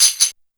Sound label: TAMBRIN, TAMBOURIN; Big Beat Samples & More; AKAI